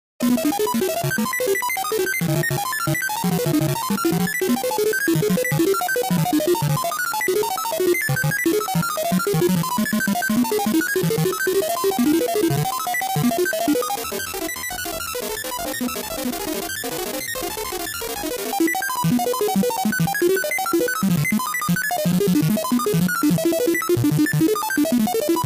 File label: information read signal